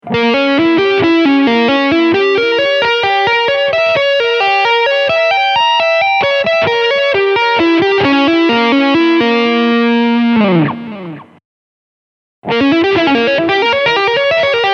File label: String Skipping